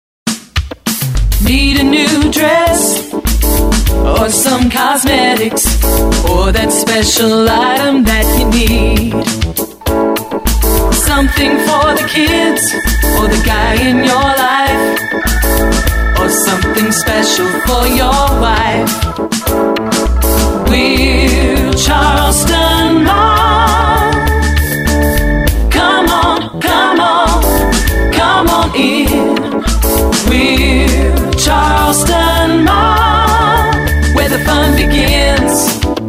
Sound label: Come on in (Nice groove)